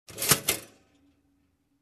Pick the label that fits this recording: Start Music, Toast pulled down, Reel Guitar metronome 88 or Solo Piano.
Toast pulled down